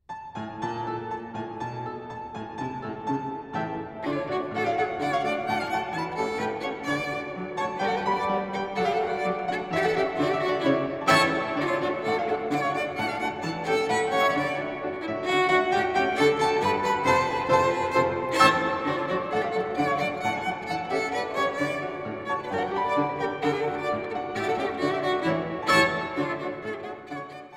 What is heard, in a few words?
Polka